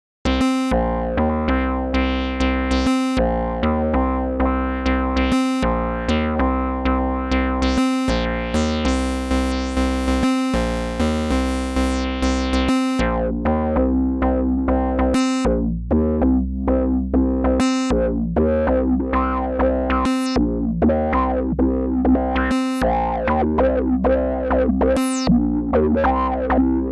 Keys; Xils; PolyKB3

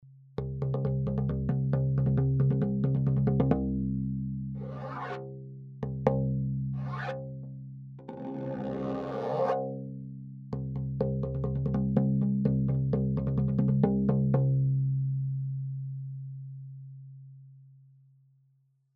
Mogees

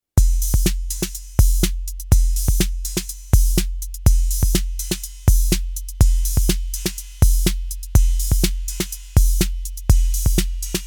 522 HH cymbal

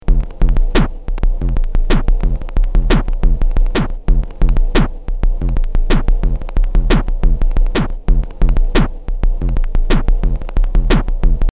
AKAI MPC60

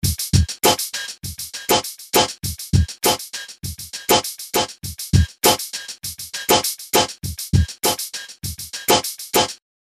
clean

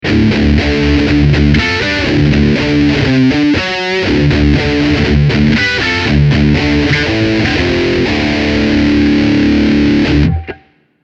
Cube 80X R Fier Plate

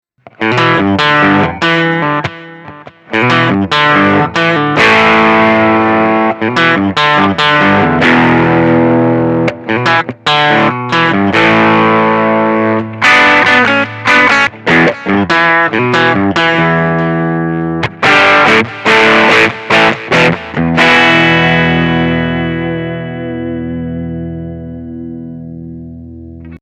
clean gain

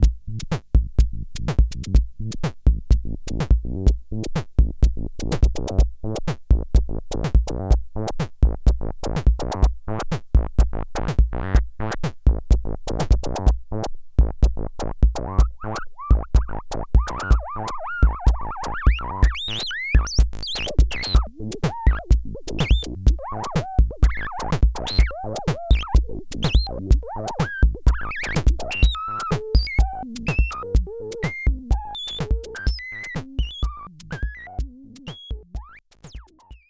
Mix Bus Slow SC 110 Hz; Rockruepel Comp.Two V2

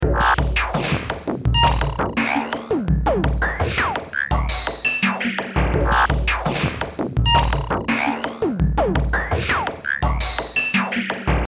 VCS3 Kit mit One Shots

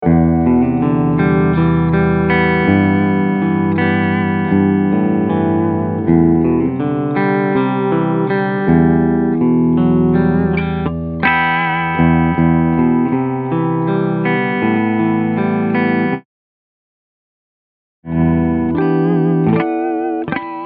Clean

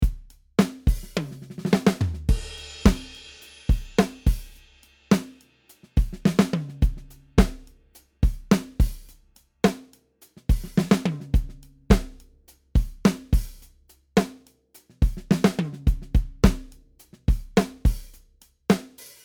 Dry Kit; Superior Drummer 3 Hitmaker

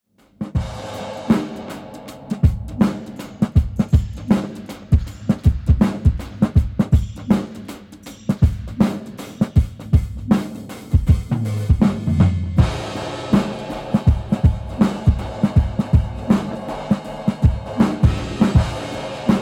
underwater drums half dynamics double lufs

